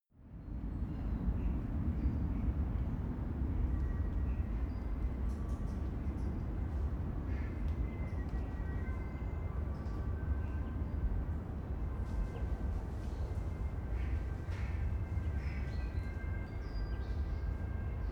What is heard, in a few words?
europe traffic ambulance distant